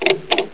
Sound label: Door open